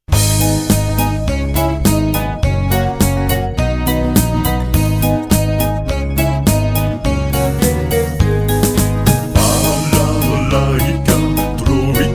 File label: Balalaika